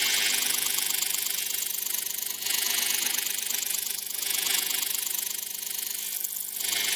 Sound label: Electrical Generator Loop; Ambience; Warframe; Orokin